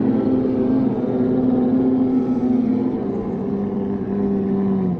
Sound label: Entrance Door